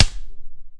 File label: snare enrichment, Cigarette Lighter; UNUSUAL KITS and FX